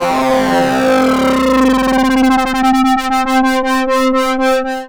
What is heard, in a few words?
Multikey slowing down